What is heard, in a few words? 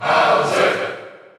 Cheer Dutch; Bowser; SSBU